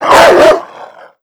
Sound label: animal dog bark; Call of Duty Modern Warfare